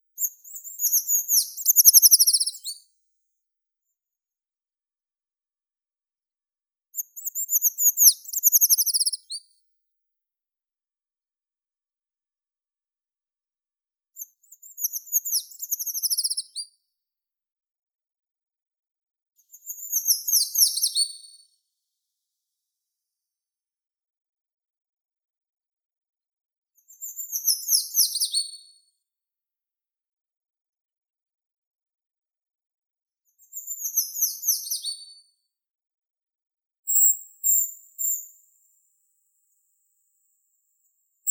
pishuha obiknovennaya certhia familiaris; zvuki prirodi

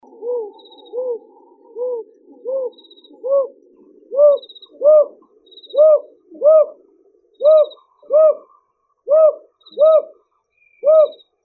sova iglonogaya ninox scutulata, zvuki prirodi

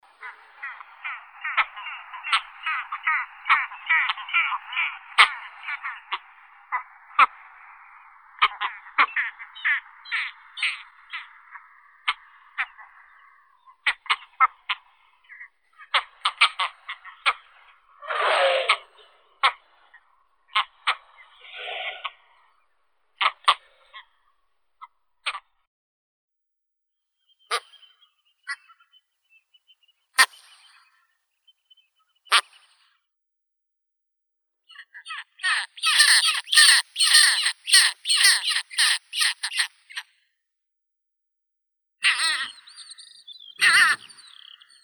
pomornik bolshoi stercorarius skua; zvuki prirodi

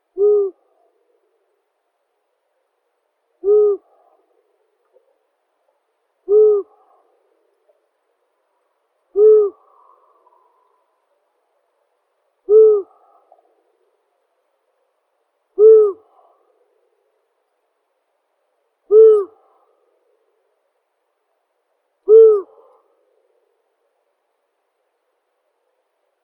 zvuki prirodi sova ushastaya asio otus

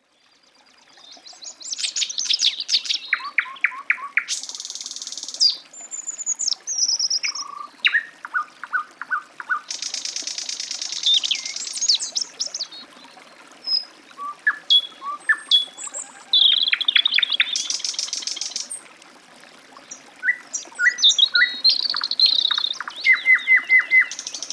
solovei i zaryanka u lesnogo ruchya, zvuki prirodi

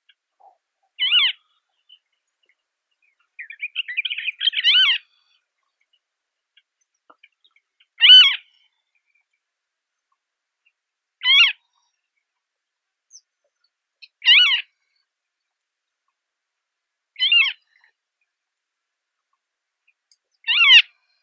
lun bolotnii circus aeruginosus

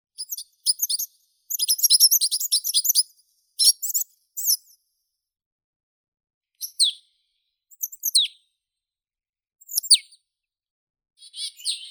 gaichka chernogolovaya poecile palustris

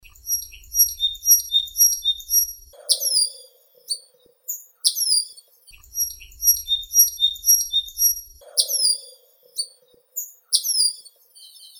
tryasoguzka drevesnaya dendronanthus indicus, zvuki prirodi

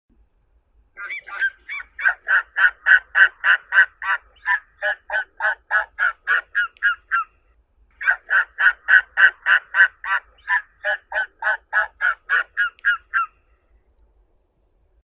orlan beloplechii haliaeetus pelagicus

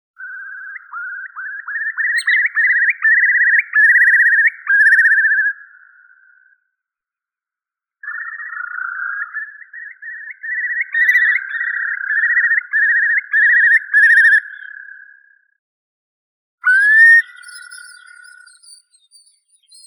kronshnep bolshoi numenius arquata

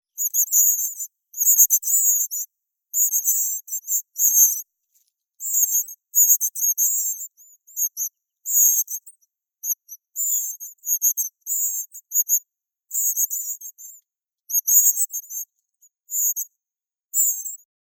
ovsyanka obiknovennaya emberiza citrinella ptenets

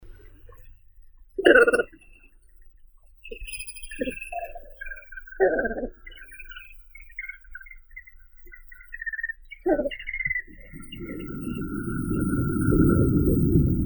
baklan krasnolitsii phalacrocorax urile

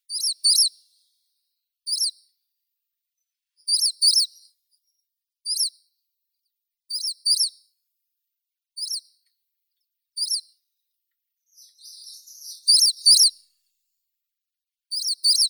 penochka tusklaya phylloscopus humei; zvuki prirodi

